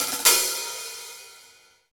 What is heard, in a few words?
Rock Hi Hat Phrase, Roland BK-5